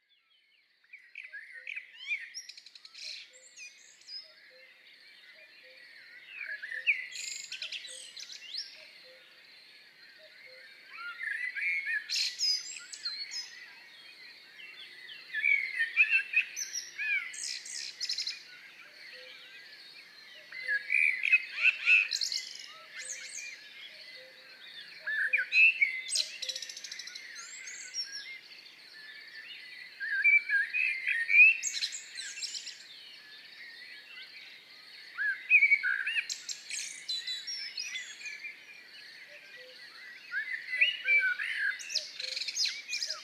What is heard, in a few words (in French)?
Ambiance nature oiseaux